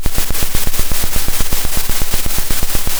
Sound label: pop, Sound of a drum roll